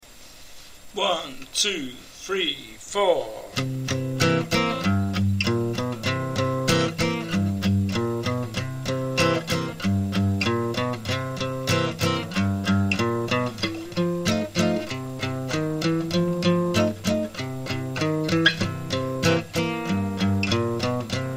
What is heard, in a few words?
c7 blues, guitar